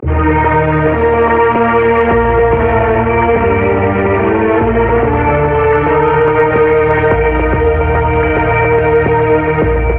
strings, sad

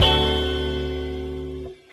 plain, Guitar; Notes

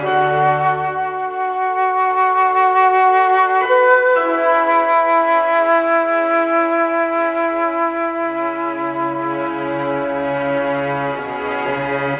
strings and flutes, Sad; loops

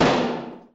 bang; Crash Bang Boom

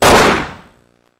gun shot; Crash Bang Boom

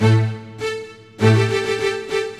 strings, Sad Loops

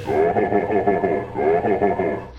laugh goofy ho ho; ooh ahh

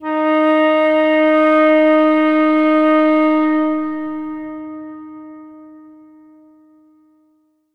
clarinet; Winds

